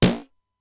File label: Simmons SDS-9; drum machine; Factory D4